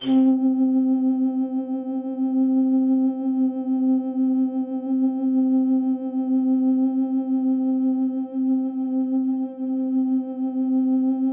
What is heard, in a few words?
C3, Synth Line